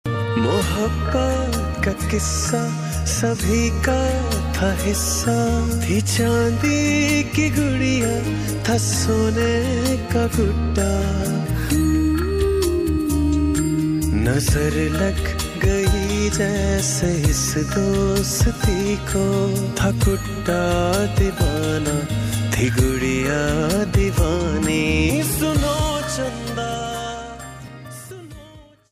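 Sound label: original soundtrack pakistani ringtone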